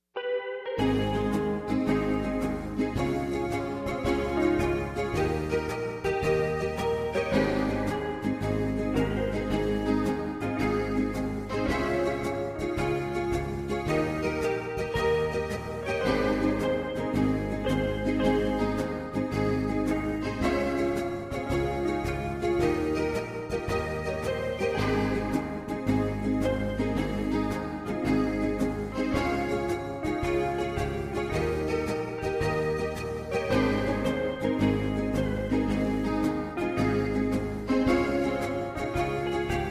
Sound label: Hawaiian; shotish